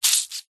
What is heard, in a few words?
paper wet damp squeeze squelch; foley